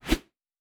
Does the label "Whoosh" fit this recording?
Yes